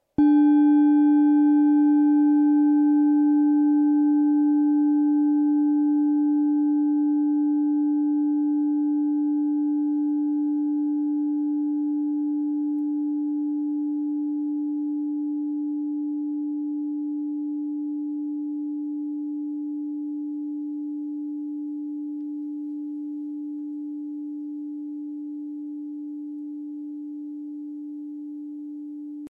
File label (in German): Fuss Schale